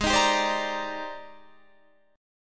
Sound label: Ab Major Sharp 11th Ab, Guitar DADGAD